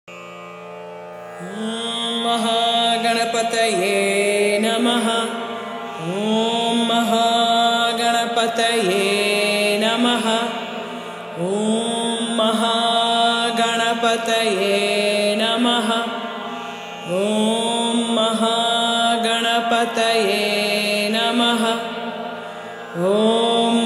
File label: Moola Mantra